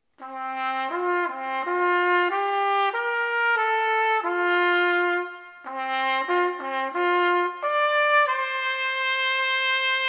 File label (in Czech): fanfara